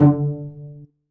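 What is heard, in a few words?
STRING PIZZICATO D2, Strings; STRING SECTION; AKAI S6000 CD-ROM - Volume 1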